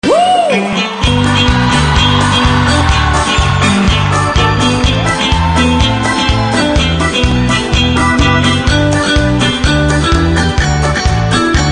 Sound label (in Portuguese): banda ao vivo